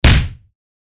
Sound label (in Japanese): 打撃; 武器効果音